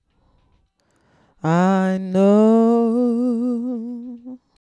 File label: lead vocal